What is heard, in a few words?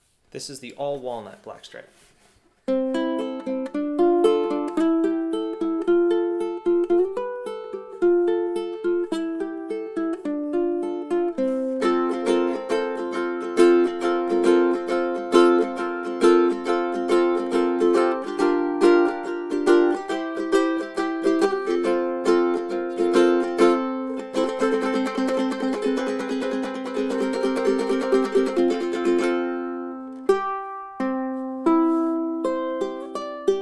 black Stripe Walnut, ukuleles